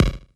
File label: Minimal Perc; Minimal Percussion